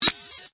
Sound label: Computer Menu Screen, Scifi, Computer, Menu, Screen, Page, Turn, Science, Fiction